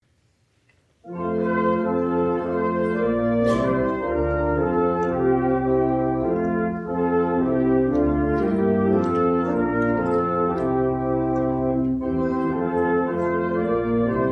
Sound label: hymn, instrumentalists; Hymn 503 Oh, That I Had a Thousand Voices